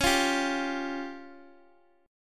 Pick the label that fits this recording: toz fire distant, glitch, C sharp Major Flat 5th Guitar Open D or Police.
C sharp Major Flat 5th Guitar Open D